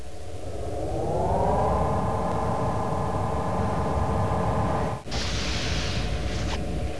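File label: turbolift; mrbo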